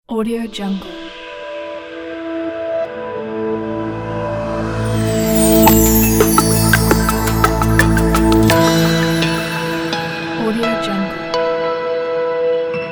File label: light airy logo pack